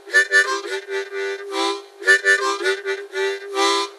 harmonica

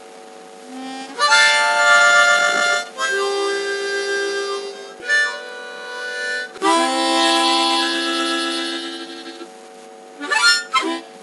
harmonica

